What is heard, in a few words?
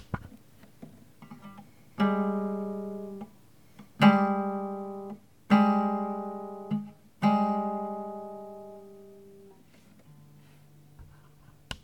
intervals m2, guitar